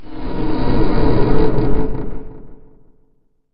metal, underground; ambient

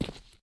default, step

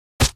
bullet hit

